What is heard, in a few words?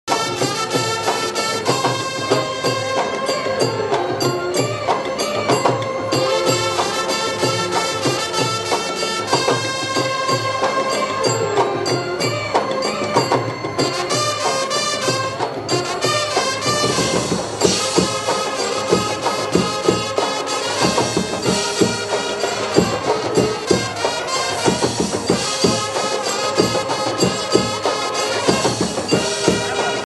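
durge durgat bhari tujvin sansari trumpet music ringtone; navratri status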